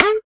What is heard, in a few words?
BUZZER; Spectrasonics; Metamorphosis